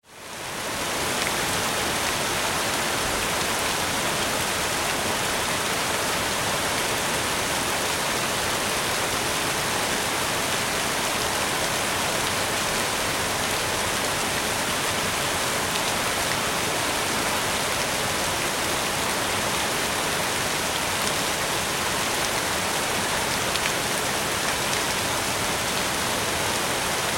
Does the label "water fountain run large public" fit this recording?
Yes